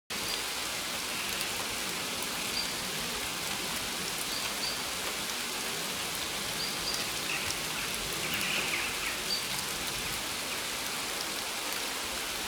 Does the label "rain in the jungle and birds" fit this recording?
Yes